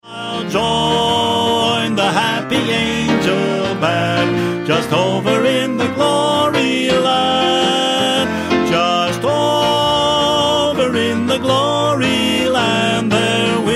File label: Northern Gospel; Lead